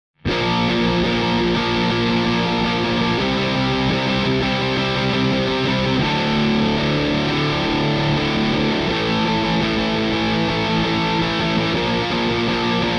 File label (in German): test gitarrensound